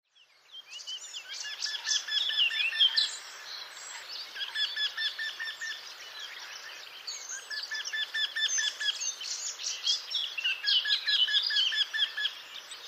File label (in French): Torcol